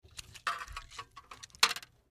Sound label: MedicalScientific; Soundfx III